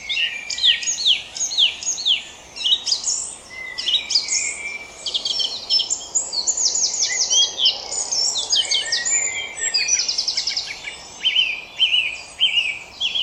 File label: general chorus